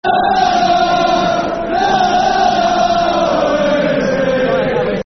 Nanananana Wednesday, Football Chants (Soccer Songs); Sheffield Wednesday Swfc